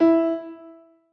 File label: Piani E